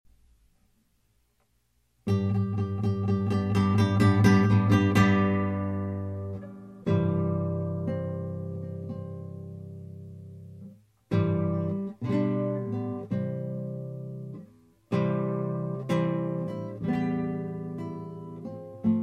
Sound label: Wedding March